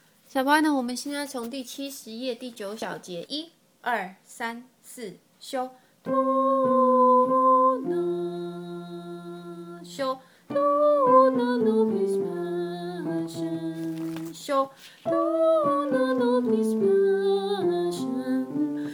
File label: Sopranos